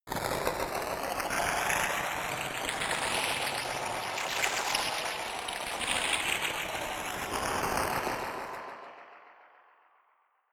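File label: water feature, science fiction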